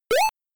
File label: Springboard Jump; Metro Cross arcade